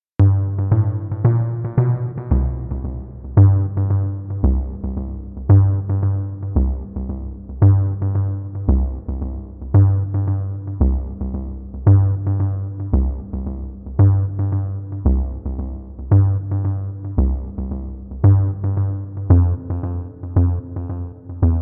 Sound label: Underwater; Super Mario Bros. 3